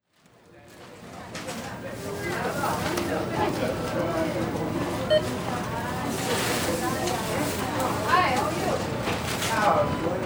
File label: supermarket small in usa